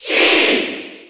Cheer German; Sheik; SSB4

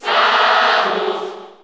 Cheer Spanish; Samus; SSBU

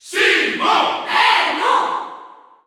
Cheer French; SSBU

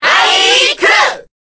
Cheer Korean; Ike; SSBB

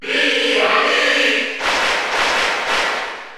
Cheer Spanish; Link & Toon Link; SSBU

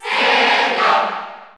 Cheer Spanish NTSC; Zelda; SSB4; SSBU

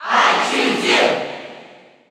Cheer English; Pokémon Trainer; SSBU

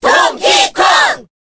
Cheer Korean; Donkey Kong; SSBB

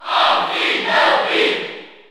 Cheer French; Greninja; SSBU

